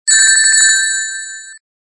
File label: Lose Rings; Tails Nightmare 3 demo